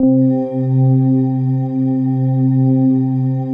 pad, atmo pads; Best Service; XX Large

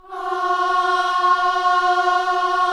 KIDS CHOIR, Gospel

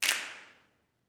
SNAPS, Gospel; Spectrasonics; Vocal Planet Vol.1 Gospel; akai

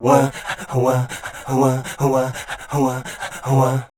SCAT C

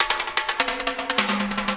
ROLLS; E-Lab; X Static Goldmine 2

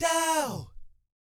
"DOW" E4, vocal jazz fx; Spectrasonics; Vocal Planet Vol.3 Jazz Fx

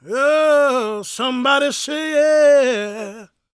GOSP MALE, Vocal Planet Gospel

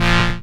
SYN CHD; E-Lab; X Static Goldmine 2; akai

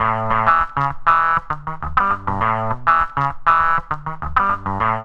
GTR LOP; E-Lab; X Static Goldmine 2; akai

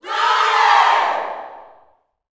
Glory shout, Vocal Gospel yell shout; Spectrasonics; Vocal Planet Vol.1 Gospel; akai

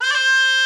FLUTE

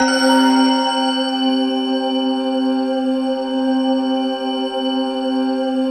ATMOPAD; Best Service; XX Large; Pads

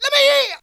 VOC

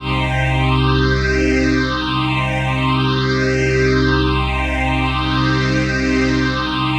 PHASE PAD, ATMO PADS; Best Service; XX Large

